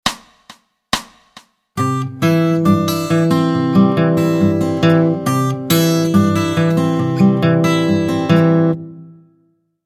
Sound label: Travis Picking